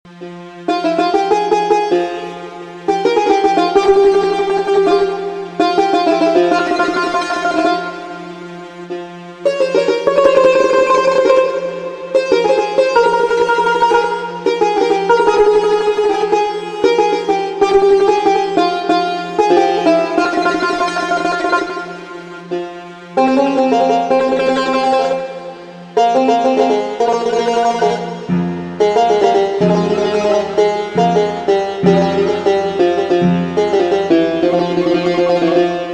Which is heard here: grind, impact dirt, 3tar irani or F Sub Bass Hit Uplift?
3tar irani